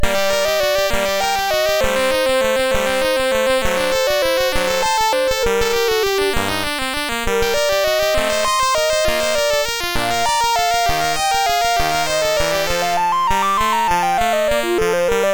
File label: Siciliana, MOS6581R3; Sontato No2; Dave Marquis